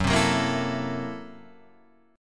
F sharp 7th Sharp 9th Flat 5th Guitar Half Step Down, chord